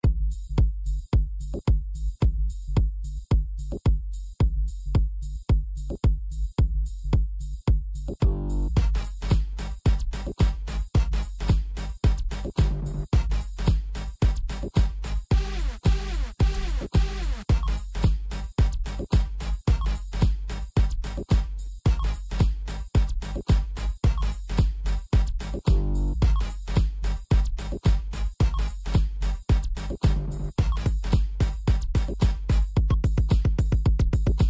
percussion; virtual dj

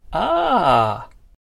ahh sounds

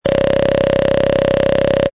BIRD WOODPECKER

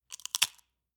Potato Chip Crunch

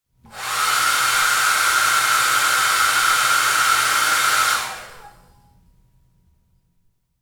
Hair Blow Dryer

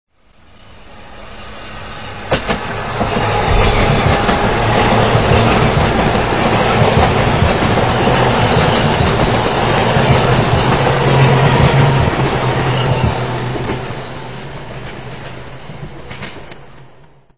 subway

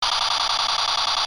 TOY MACHINEGUN

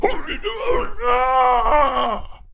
zombie gibberish

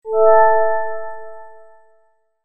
ALERT

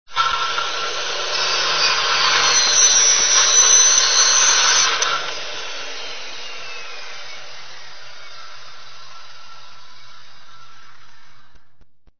powersaw

